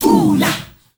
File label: THOO LAHH L, GRP CHANT; Spectrasonics; Heart of Africa 1